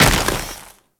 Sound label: Moa Combined Step Snow, Footsteps; Warframe